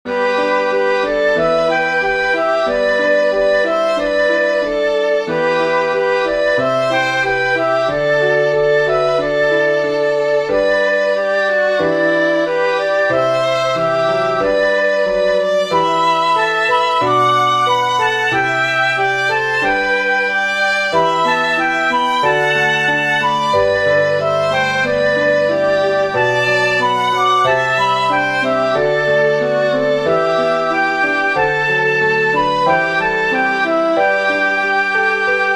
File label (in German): Mo Li Hua Trio Fl Vl Klavier